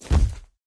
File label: hunter step; video games; ambient; source engine; portal2; outland